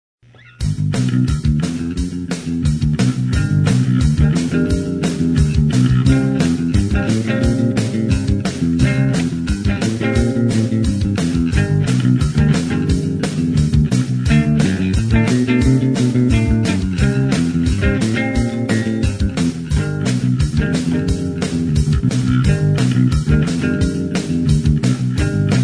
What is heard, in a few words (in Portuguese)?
Um outro blues muito doido